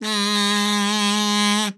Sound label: KAZOO A3; Xsample VOL-9